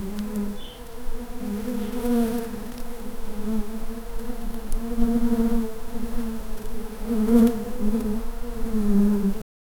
soft humming buzzing bees

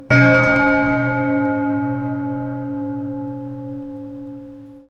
deep strike of an old, antique

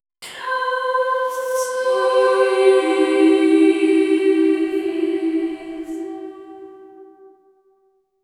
the church choir female, angelic

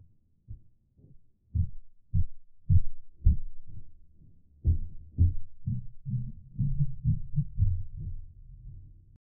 monster, sound-effects

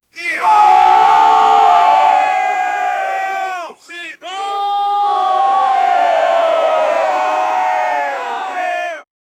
army chanting za ha dume

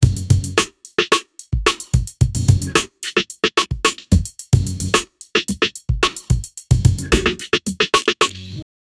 funky beat break

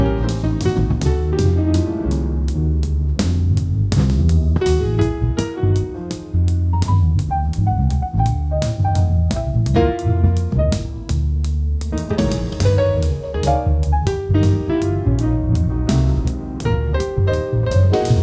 jazz noir night

